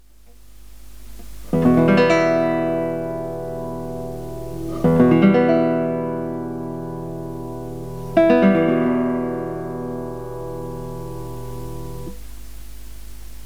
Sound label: chord, guitar